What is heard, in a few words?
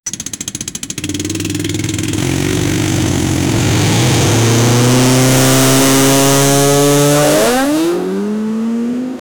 moto gp bike ignition sta; motorcycle